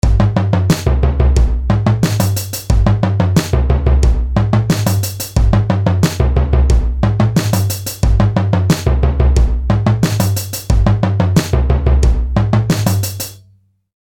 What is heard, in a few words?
third beat